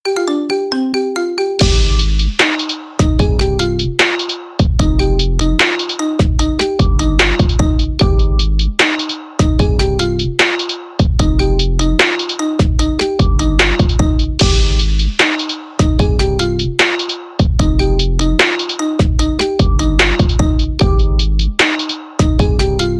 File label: Sad Marimba Remix